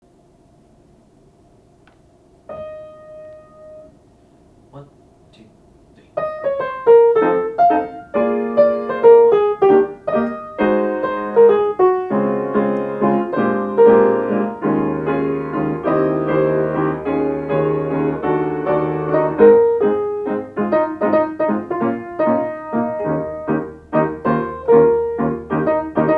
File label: melody